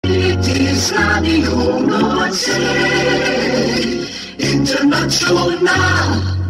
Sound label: tune; Radio Noordzee Internationaal; WLLKS Pirate Radio; Het station begon met uitzenden vanaf het zendschip MEBO II op 23 januari 1970.